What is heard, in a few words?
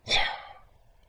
Through